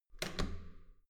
metal door heavy handle down

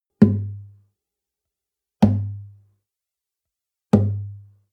nylon guitar drum single tonal bass

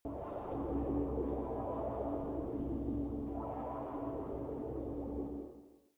space fabric liquid transport

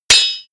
sword hits

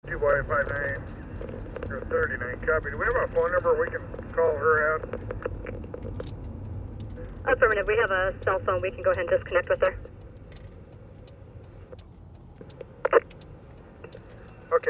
police radio with siren

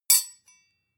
sword hit clack soft

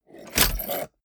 mechanics small snap

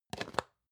plastic can small lid close medium

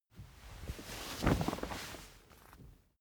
camping chair sit

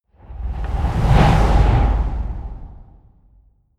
whoosh flutter

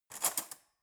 cutlery fork drawer handle grab

